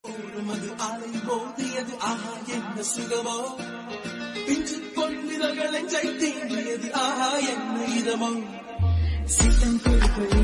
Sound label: Remix Ringtone